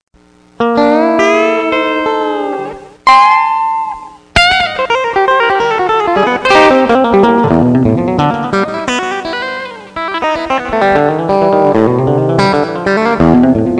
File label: riff, guitars